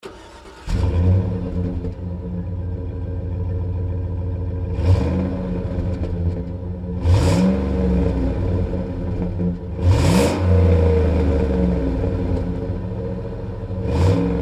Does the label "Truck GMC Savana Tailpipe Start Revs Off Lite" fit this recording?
Yes